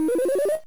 Item Pop

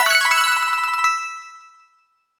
Coin; SMM2; SM3DW; 50-Coin

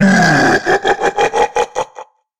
Bowser Laugh; Odyssey

